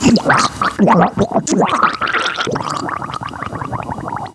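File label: drowning female; DAoC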